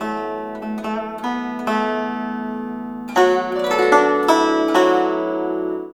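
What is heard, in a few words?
guzheng; Spectrasonics; Heart of Asia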